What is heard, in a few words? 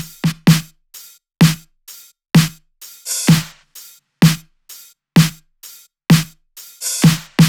128BPM Latin Kit; Latin E Kit